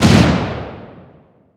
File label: rocketlauncher initial fire; weapons